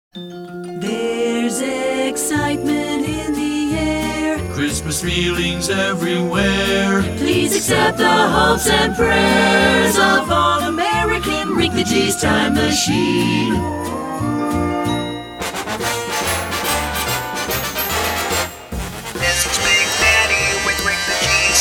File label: Jingle; Short Attention Jingle Show IV